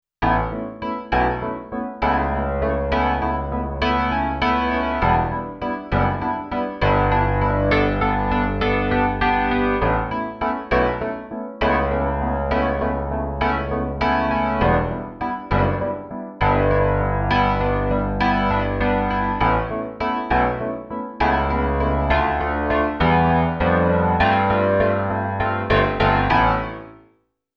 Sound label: Whirly Road; 16-bit, 44.1 kHz stereo; xp-80; Whirly Rode; roland; roland demo cd-3